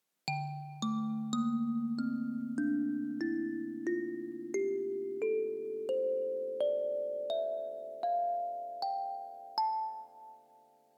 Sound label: Prodigal 15 Mbira